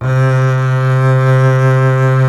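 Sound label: string contrabass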